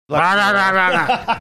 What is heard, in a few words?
loud sounds over talking